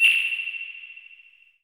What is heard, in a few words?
Sonar